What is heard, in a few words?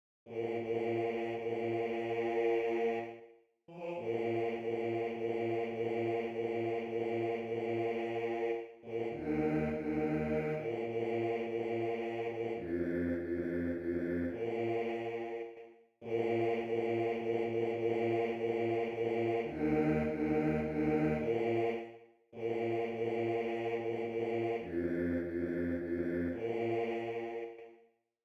Bass II; Rorate